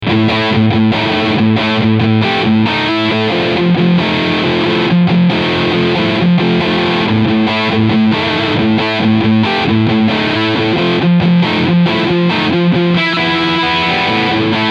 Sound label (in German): Harley Benton ST 20HSS Riffsound Steg Pickup